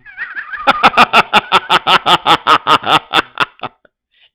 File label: crazy laugh